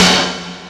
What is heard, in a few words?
Gated mid Snare; percussion; SDs; SamplePool3